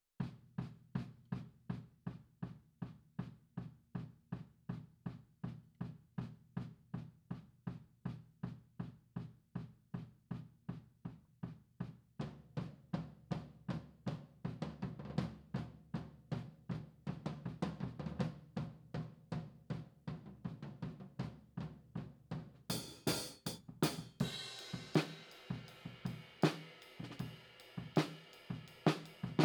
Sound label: HiHat, 160 BPM